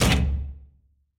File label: Door Start; Game rip; MiSide